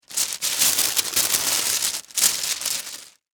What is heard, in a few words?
Household Wax Paper Single Sheet Movement; Cleaning